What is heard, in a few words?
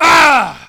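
hit, male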